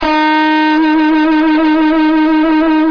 Draw Bend Vibrato

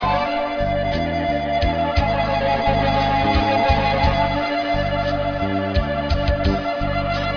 Dip Shake

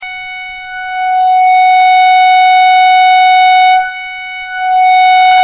Hand Resonance

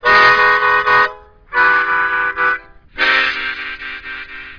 Special Tunings

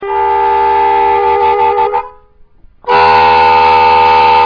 Slur, diatonic

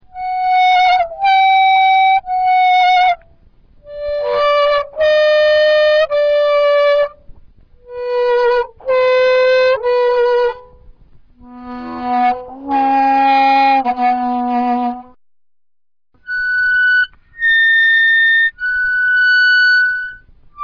Overbends, diatonic

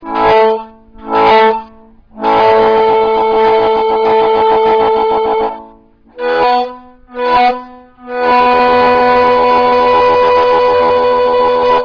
Flutter, diatonic

